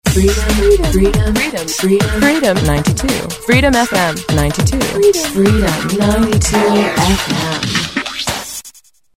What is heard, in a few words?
stab, jingles